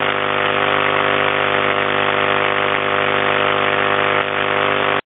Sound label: Plasma TV 3.449MHz